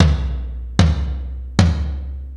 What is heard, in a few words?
Ambient Rock, E-MU Pro-Cussion